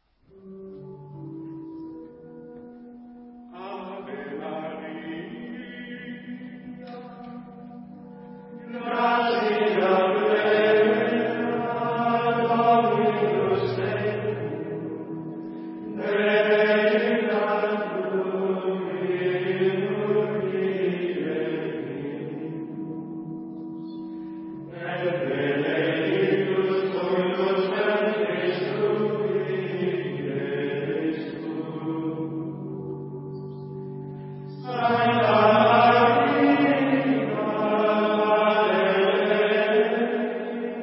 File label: Ave Maria (ant) Gregorian Chants; 古典（格列高利）